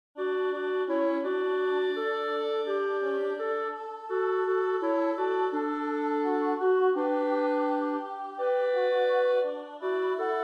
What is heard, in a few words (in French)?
CLARINETTE; CelesteJerusalem